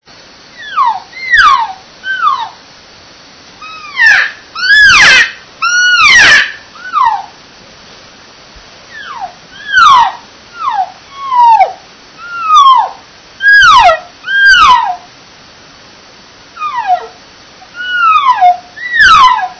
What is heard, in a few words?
cow, elk; elk 2001